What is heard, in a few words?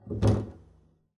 pizz noise strum long, double bass